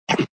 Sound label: bite; fishing